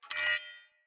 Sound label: Sword Whoosh Accent; Weapons; Swords; Garren Sword